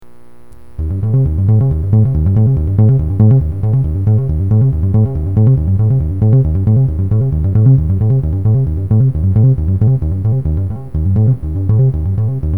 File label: bass